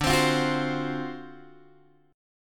D 7th Sharp 9th, Guitar Open G

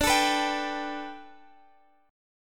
A 7th Suspended 2nd Suspended 4th

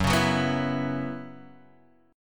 B Suspended 2nd Suspended 4th Gb

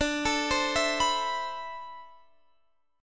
D 13th Suspended 2nd D

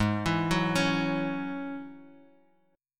Ab 7th Suspended 4th Sharp 5th down, chord

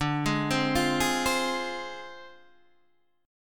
D Minor 7th Flat 5th Guitar DADGAD

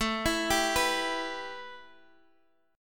A 7th Suspended 2nd, A, down, Guitar, Open G

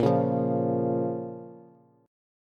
A sharp Minor A sharp down Bass; strummed; JGuitar

